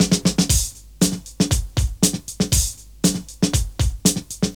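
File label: drum loops, left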